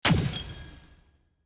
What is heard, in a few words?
block destruction glass